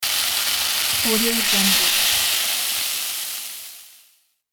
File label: sizzle